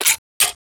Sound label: FX - Gun Clack, Hip Hop; Cymatics - 9God Hip Hop Sample Pack